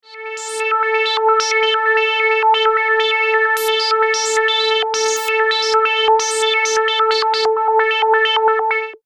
classic sh; tellun

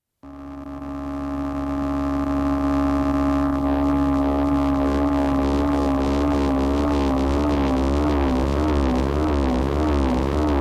fake vibrato

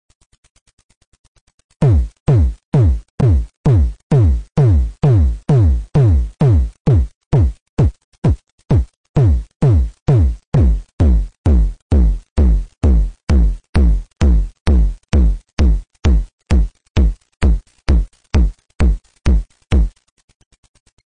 tom, synsonic

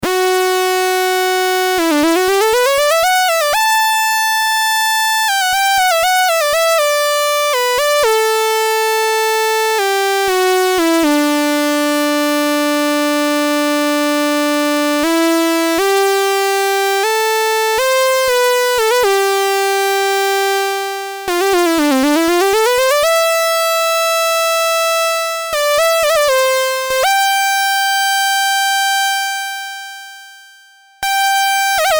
anim step-saw; tellun

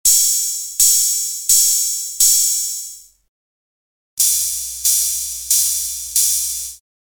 oh 110, new drums, dr110

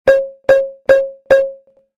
warp cow, drums; paia

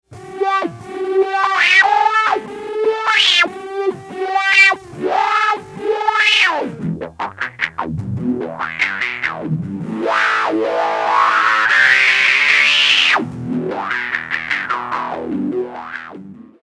cry baby; tellun

